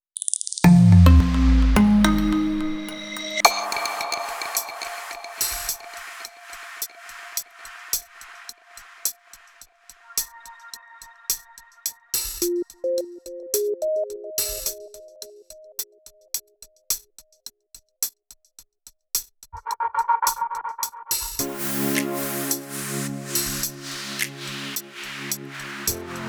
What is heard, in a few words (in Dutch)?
Logomuziek Bedje Rustig lager tempo